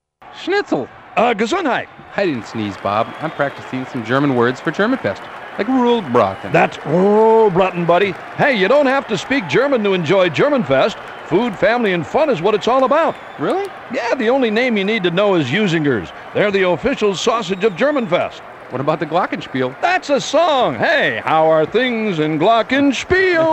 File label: Glockenspiel Song; Radio Commercials; – 30 seconds